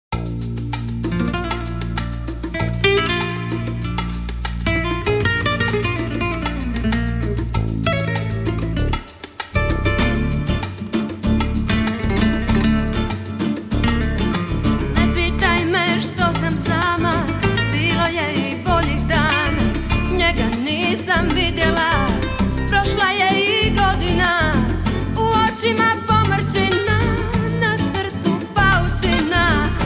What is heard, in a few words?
duet